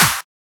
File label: Clap; osu